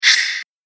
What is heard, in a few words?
Fast Cutting Whoosh; Whoosh Sound Effects